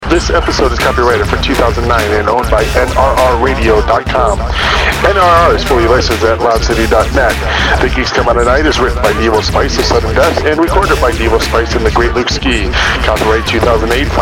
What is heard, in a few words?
Outro